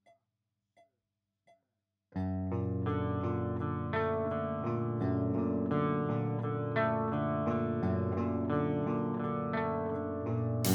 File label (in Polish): riff proba